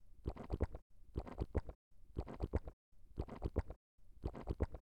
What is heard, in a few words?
Heart Beat